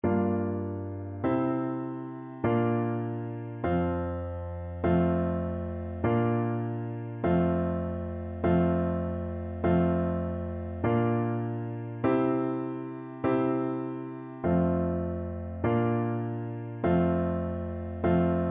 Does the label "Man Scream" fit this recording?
No